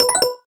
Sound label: Gpe Rubis Catched; rayman jungle run android ios mobile windows gamerip 2012